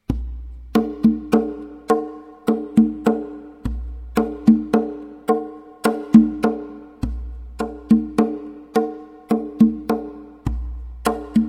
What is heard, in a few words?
tonbak; The right hand bak is substituted by a meyaneh.